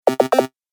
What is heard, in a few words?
Retro Gaming Sound; Video Game Sound Effects